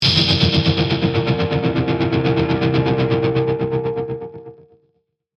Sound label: Distortion Burst, Fuzz, Tremolo; Production Element, Imaging Element, Accent, Transition; BlastWave